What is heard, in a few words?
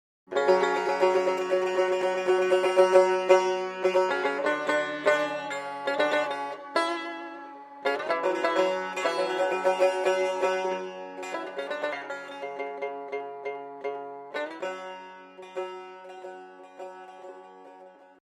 tar